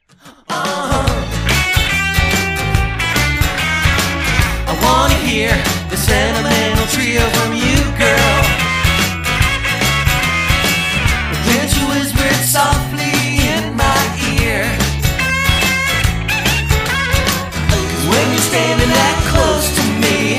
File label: Sentimental Trio